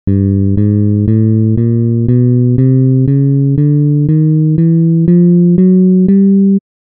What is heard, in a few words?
chromatic scale, bass synthesis; Chromatic scale - finger-style (FS)